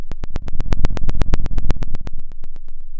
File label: Sound of a fire crackling